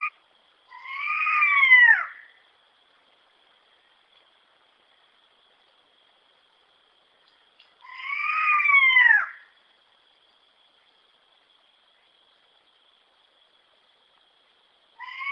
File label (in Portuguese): Canto da Coruja listrada